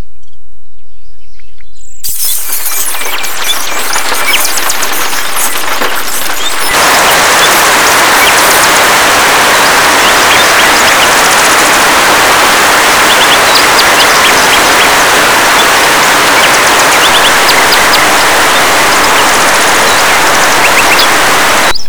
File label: Sonification of Network Traffic Flow